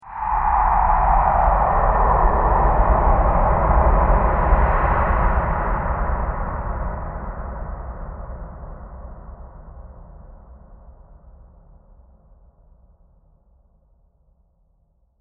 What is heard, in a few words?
Breathy Science Fiction Transition; CraftedSignals